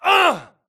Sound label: grunt death quick; Titanfall 2